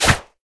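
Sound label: Battle Scratch down; The Denpa Men; Android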